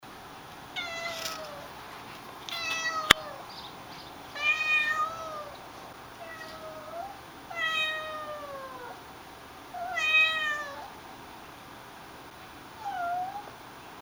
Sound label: Ceylon Meow